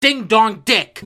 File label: ding dong